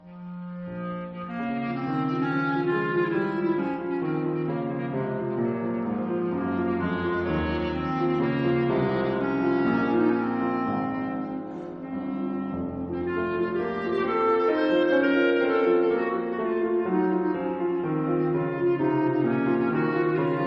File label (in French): Clarinette Var3; chambre; DORIVAL